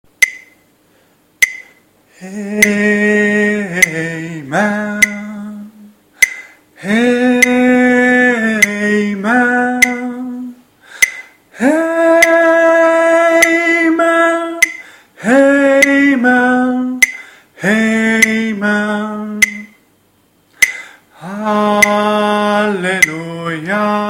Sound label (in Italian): amen tenori, coro